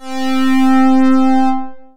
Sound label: Synth Pad; Red Sky at Night; Analog